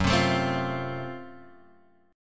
Gb Minor Major 7th Flat 5th, Guitar, Open G, chord